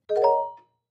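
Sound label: Clavichord Short Arpeggio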